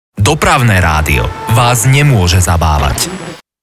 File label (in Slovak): jingel teasing